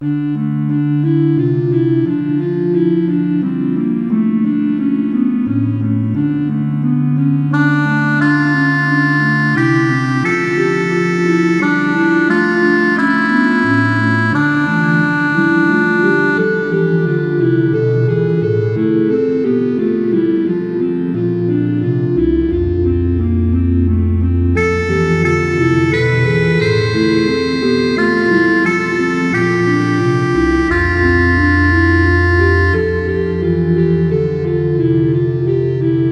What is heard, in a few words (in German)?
o heiland reiss den himmel auf orgel